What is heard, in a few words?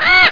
PARROT